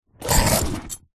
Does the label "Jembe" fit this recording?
No